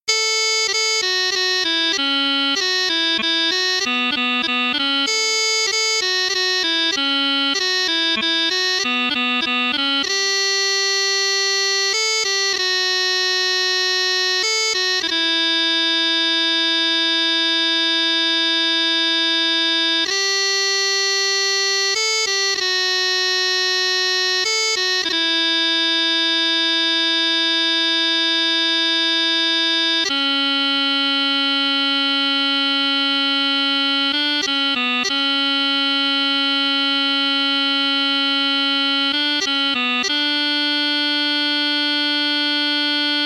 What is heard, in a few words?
Steam Train